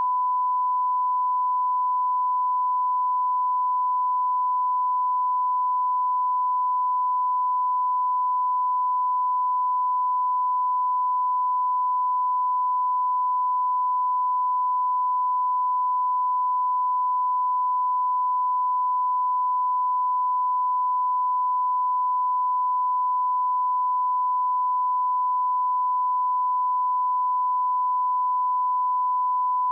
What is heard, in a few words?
sine 1kHz, 48kHz